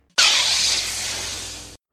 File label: lightsaber ignition; sith